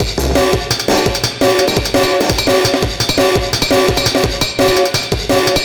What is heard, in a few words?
Jungle Bell Verb Beat; KJ Sawka; Drum n Bass & Beyond